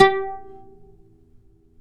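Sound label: pluck OUD G; Roland - LA Composer vol. 3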